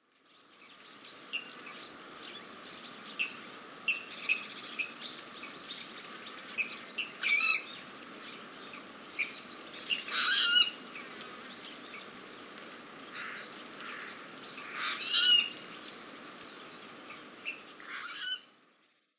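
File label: kuina, Water Rail; koe; watarase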